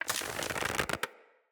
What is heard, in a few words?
Bow Charge; Weapons; Player; Bows; DendritBow